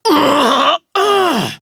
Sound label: Pain Female; Warframe; Tau; Prime Grineer Female; Battle